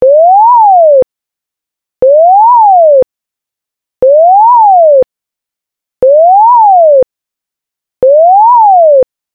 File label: Tone